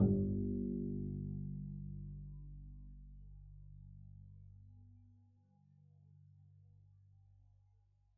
pizz g1, double bass

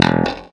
e2 staccato, glowly bass

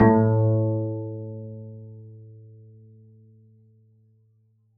pizz a2, double bass

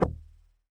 noise muted strum, swag bass

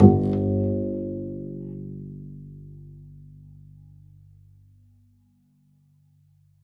pizz g1, double bass

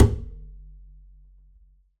perc, pizz; meatbass

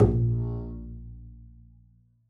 pizz bb1, double bass

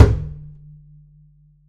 perc, pizz; meatbass

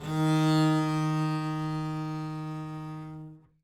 eb3 down, bass arco; meatbass

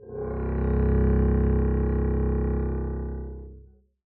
arco c1 f up, double bass

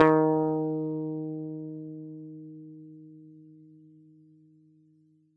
eb4 fff, swagbass

